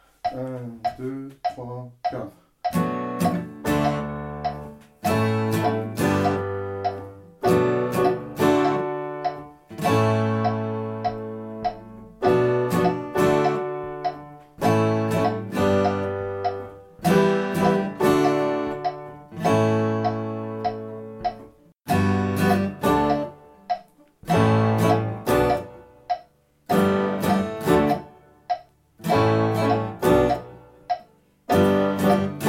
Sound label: Guit Piano